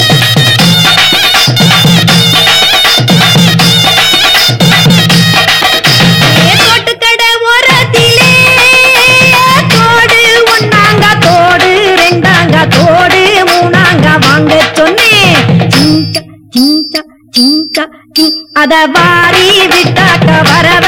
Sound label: Folk